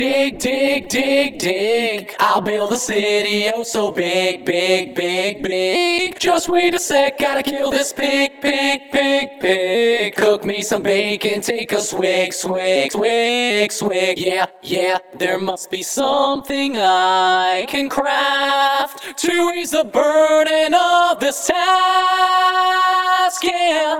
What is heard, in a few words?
Vocals